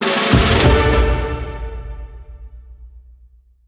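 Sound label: Jingle Coming soon Arena; Abraca Imagic Games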